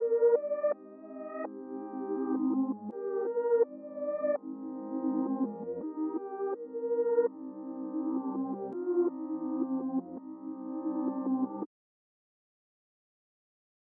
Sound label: 165 BPM C Min Key; Reverse; Stems; Cymatics; 2022 Melody Collection (BETA); Fear And Greed